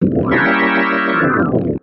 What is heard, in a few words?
Sfx Baseball